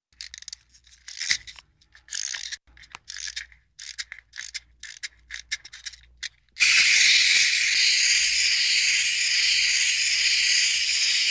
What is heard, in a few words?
Wind-up Small Toy Motor Chattering Teeth Winding Up, cartoon; Hollywood Edge; Cartoon Trax